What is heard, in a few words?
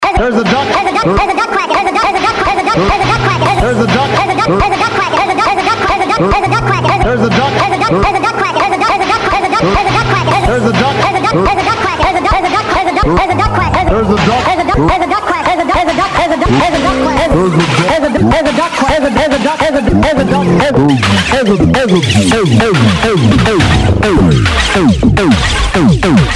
DRUMS test morphs